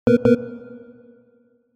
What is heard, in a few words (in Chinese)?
选择错误